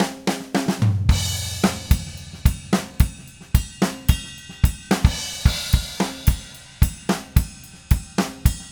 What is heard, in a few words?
Red Maple Groove 110, DW Red Maple Kit